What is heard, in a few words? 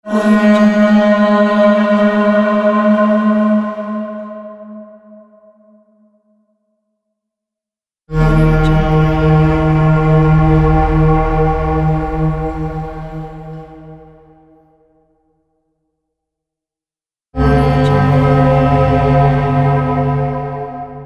viking horn